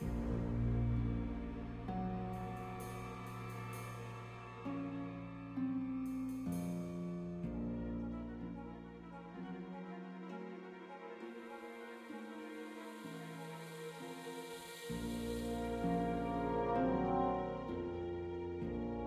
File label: Spooky Forest; King's Quest Chapter 1: A Knight to Remember